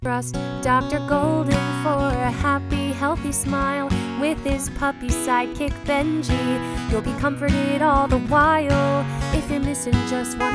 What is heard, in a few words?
Jingle; Dr. Gulden